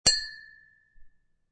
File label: Wine Glass Clink Two Wine Glasses Clink Together In A Toast Cheers; Glass Sound Effects; Lynne Music